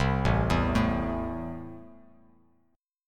A Minor, Bass Standard; 8,0,2,2; JGuitar

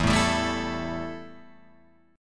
E Minor Double Flat 5th E Guitar Open F; Embb5 strummed

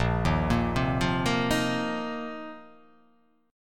Bb Suspended 2nd Suspended 4th, Bbsus2sus4 chord; arpeggiated

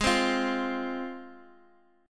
Ab 6th Ab, Guitar, DADGAD

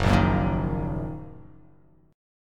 Fsharp 6th down, chord

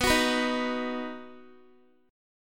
B Major, Guitar, 4E,4A,5D,5G,5C,6F; strummed; x,14,16,16,15,x

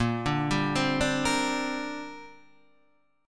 Bb 6th Add 9th Bb Guitar Open D

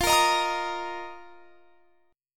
F 7th Suspended 4th F down; strummed

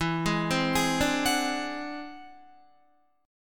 E Augmented 9th E down Guitar; E+9 arpeggiated; JGuitar

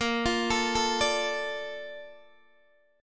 Bb Major 7th, Bb, down, Guitar, DADGAD

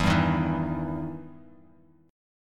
E Minor Major 7th E down Bass Standard

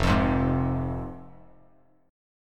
F Minor F down Bass Standard; Fm strummed

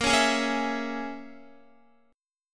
A sharp Minor 7th Flat 5th, down, Guitar; 4E,4A,5D,5G,5C,6F; strummed

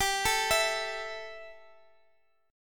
E Minor Double Flat 5th G, guitar

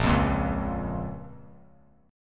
A sharp Minor A sharp down; strummed; JGuitar

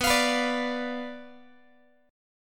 B Diminished B Guitar; Bdim strummed; JGuitar

